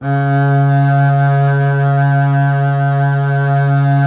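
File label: string contrabass C0; Miroslav Vitous - Solo Instruments VOL-2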